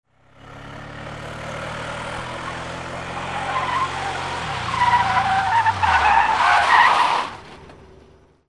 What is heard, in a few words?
CARS